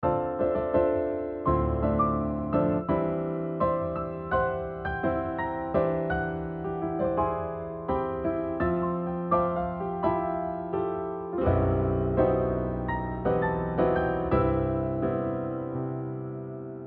non-functional chord progressions

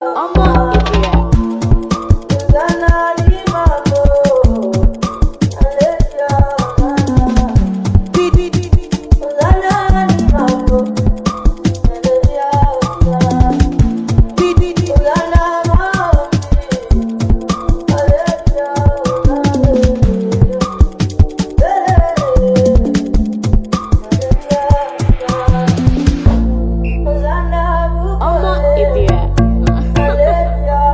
afro dance

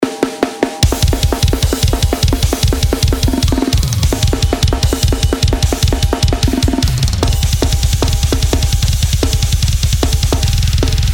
Drums

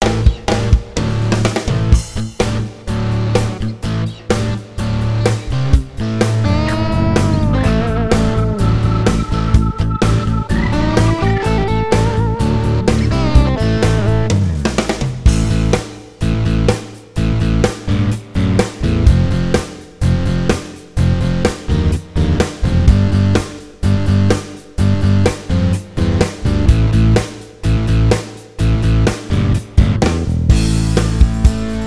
ROCK PA4X; Korg

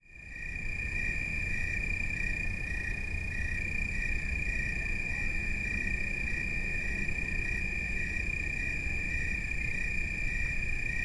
nightsong

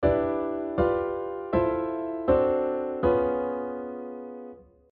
block chords

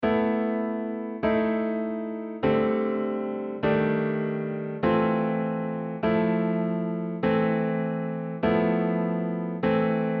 chord progression ideas

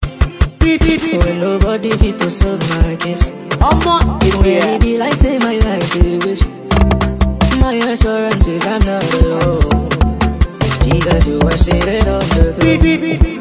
Speed Up

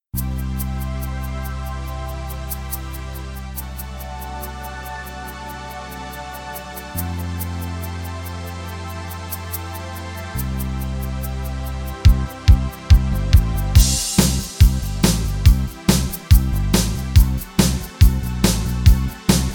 SOLINA

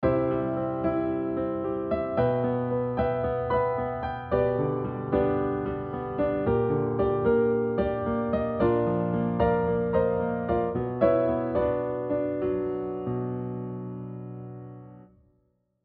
chord transformations

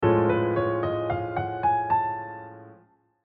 lydian #5

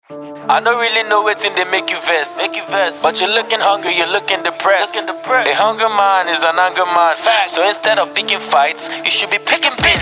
DISS

